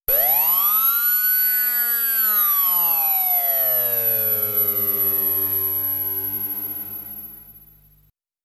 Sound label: trance